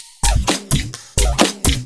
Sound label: Electro Loop 128BPM; Vengeance Samples